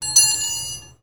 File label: Drop, INDUSTRIAL